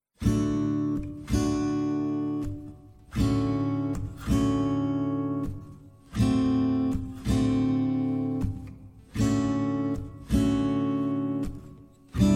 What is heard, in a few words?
Playalong Ballade